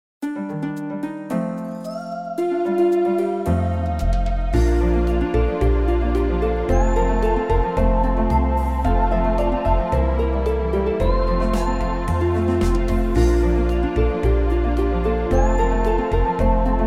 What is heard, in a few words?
country ballad